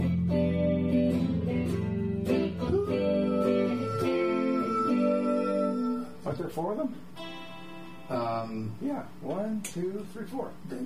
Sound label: Break Down Vocals